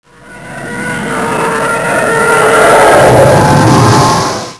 tie pass; tie fighter

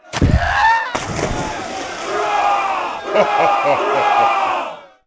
duel outro amb lose; Sid Meiers Pirates; XBOX

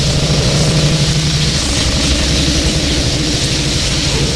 force beam

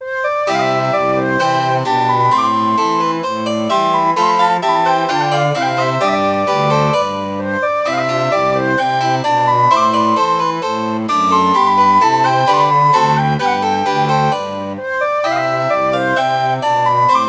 dance normal; Sid Meiers Pirates; XBOX

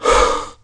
Ranger Breath Out

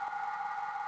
rough sand; Quantum Redshift

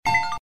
Reel Stops, slots

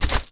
droid sm fall dirt

